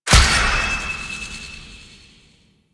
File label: Decode Destroyed; Warframe; Emissary Season Two